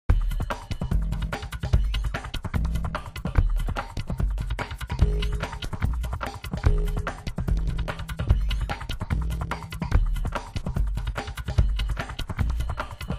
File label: loop groooov; WMBonusXP